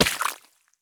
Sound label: sprint puddle; Escape from Tarkov